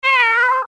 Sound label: meow